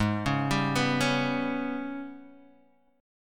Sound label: Ab Minor Major 7th Double Flat 5th, down, Guitar, Standard; AbmM7bb5; arpeggiated